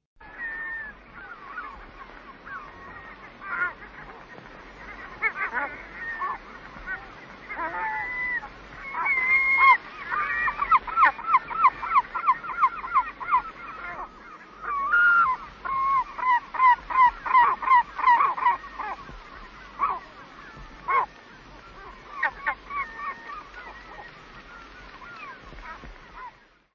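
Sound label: seagulls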